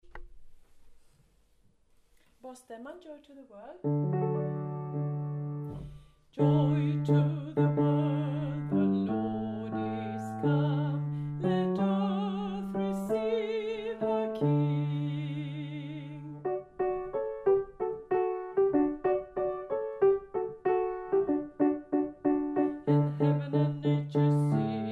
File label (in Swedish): bas